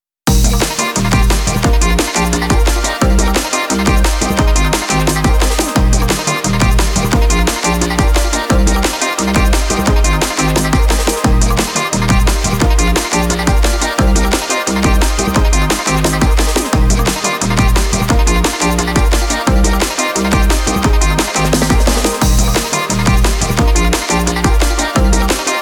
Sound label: DrumBass; waveform ashizawa review